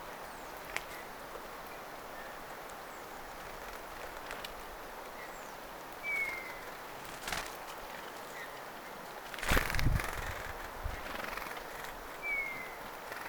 taustalla toyhtotiainen kaksi punatulkun haikeata vihellysta; kaksi punatulkun haikeaa vihellystä

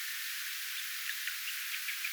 tietaakseni erikoisia valkoviklonuoren aania kolme lahiaania

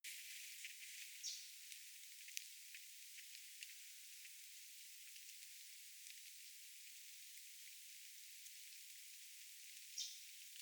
rastaan poikanen olisiko keltanokkarastas mika rastaslaji

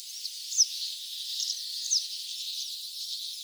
tuollainen keltavastarakkilintu kaksi lentoaanta sen noustessa lentoon

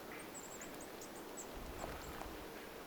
kuusitiaisen pienoissavellys

